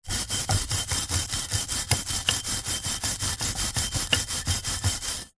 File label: industrial wire brush on metal solid; zapsplat